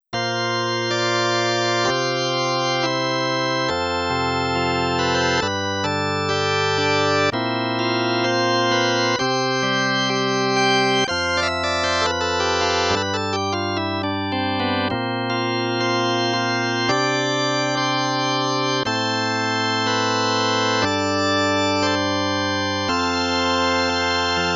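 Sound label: electric organ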